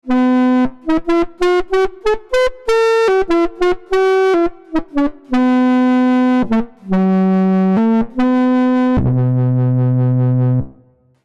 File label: Plastic sax